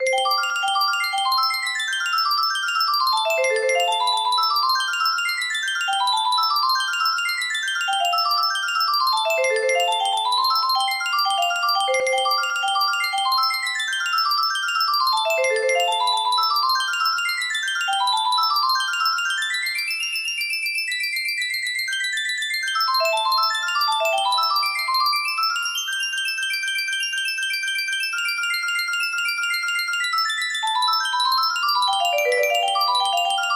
in C major, music box melody

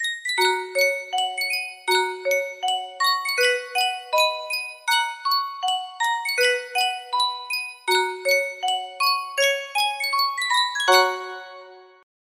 yunsheng music box

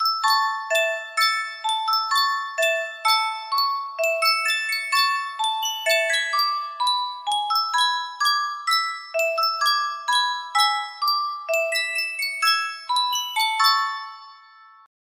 music box

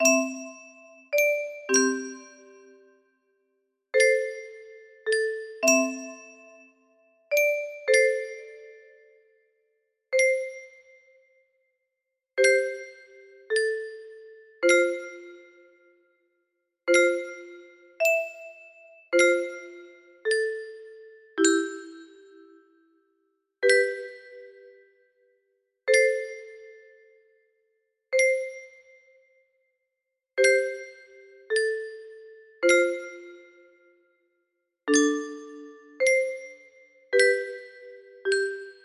melody, music box

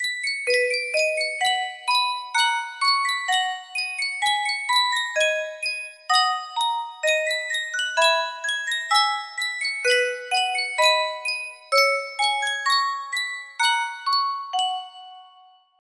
yunsheng music box, music box melody

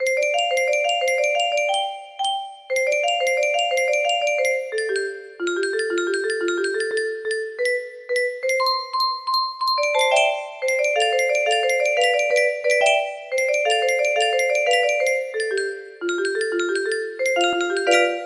ragtime, music box; paper mario color splash

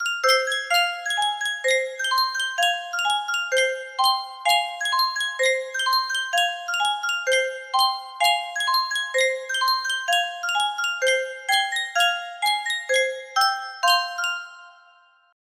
Sankyo music box, music box melody

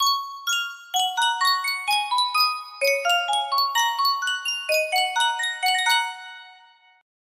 miniature music box; sankyo

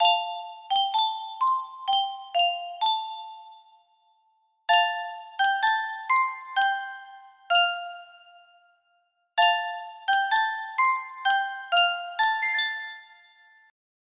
Yunsheng custom tune music box

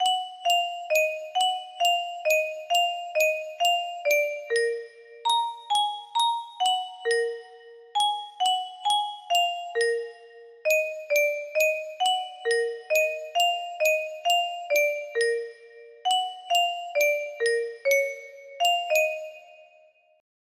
music box, music box melody

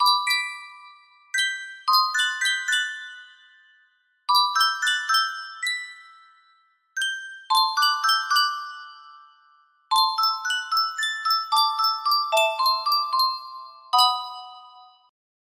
Sankyo custom tune music box, music box melody

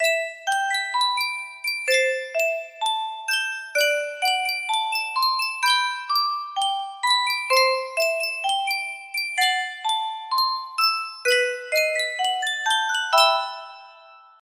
sankyo music box unknown tune

